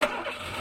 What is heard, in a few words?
engine start